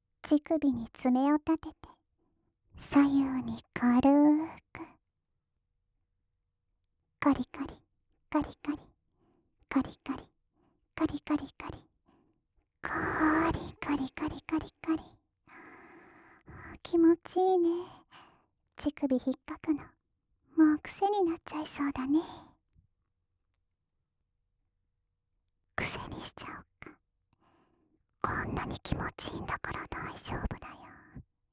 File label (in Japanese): カリカリ ふつう; ロリ